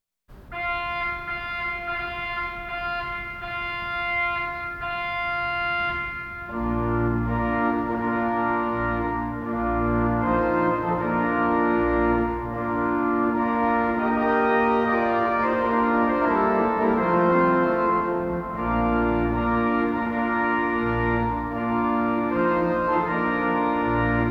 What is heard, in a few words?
Bridal Chorus